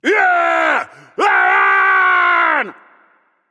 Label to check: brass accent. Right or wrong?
Wrong